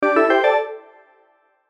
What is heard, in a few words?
checkmate